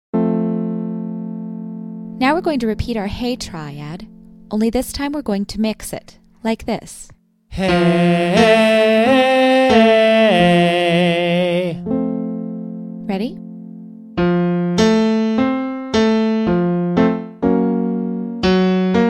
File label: Mix Hey Triad